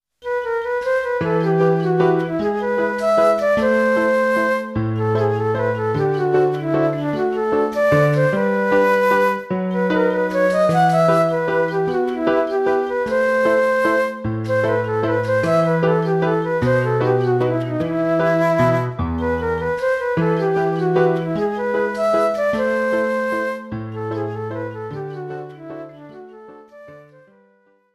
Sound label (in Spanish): Valsecito criollo